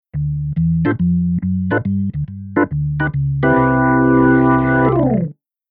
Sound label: Keyboard Organ Amp